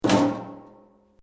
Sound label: METAL BOX; Metal (Sound Effects:Materials)